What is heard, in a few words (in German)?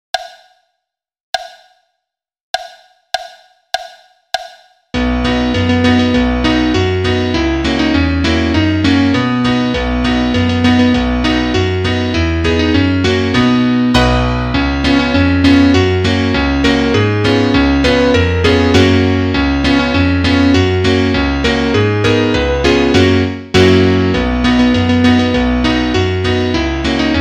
Klavier Solo, trompete; Etüde in Des